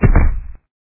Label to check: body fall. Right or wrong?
Right